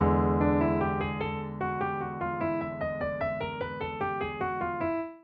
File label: blues